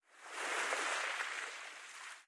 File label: swim; out of reach treasure royale 2020 gamerip pc